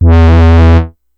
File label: Synth oneshot Long E, Synth Bass One Shots; Slate Digital BASSHOLE Sample Pack